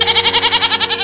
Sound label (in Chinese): shanyang; animal